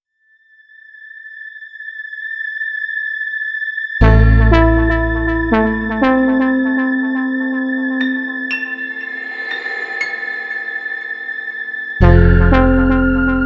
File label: Music One Shot; gamerip; Zombie Army Trilogy; PS4